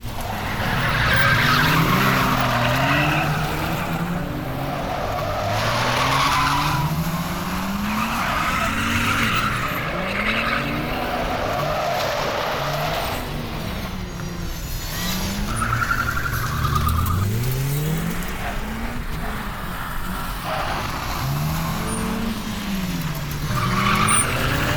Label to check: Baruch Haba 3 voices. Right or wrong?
Wrong